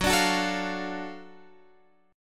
F sharp Minor Major 7th Sharp 5th F sharp down

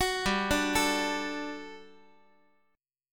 G Major 7th Suspended 2nd G down Guitar; GM7sus2 arpeggiated; x,x,9,5,6,8

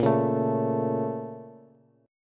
Bb Minor Major 7th, Bass; x,13,11,14; strummed; chord shape

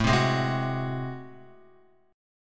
A Minor Major 13th, down, Guitar; AmM13 strummed

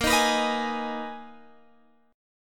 B Major 13th A sharp

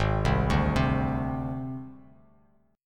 Ab Major Flat 5th Ab Bass Standard; AbMb5 arpeggiated; JGuitar

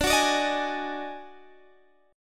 Eb Minor Major 11th D

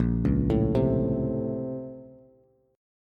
A sharp Minor Major 9th, down, Bass

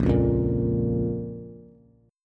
A sharp Minor A sharp down Bass; strummed; JGuitar

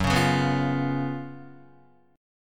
Gb Major 7th Suspended 4th Gb, Guitar; GbM7sus4; strummed; 1,4,1,4,1,1; 4F,4A,5C,5G,5C,6E

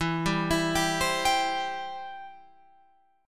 E Minor Sharp 5th, Guitar, Open F; Em#5 arpeggiated; JGuitar

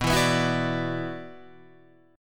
F sharp 7th Suspended 4th Sharp 5th B

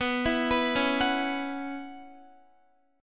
B Suspended 2nd Suspended 4th, Guitar; Bsus2sus4; arpeggiated; x,14,14,16,13,13

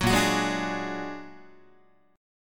A sharp Augmented 7th A sharp Guitar; A#+7 strummed; JGuitar

